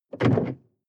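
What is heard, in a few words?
sports car interior trunk close medium; verkehr transport; autos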